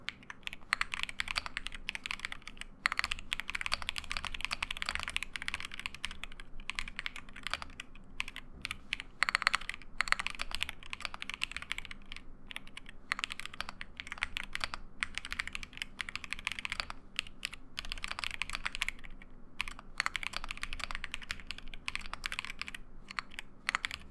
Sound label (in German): tippen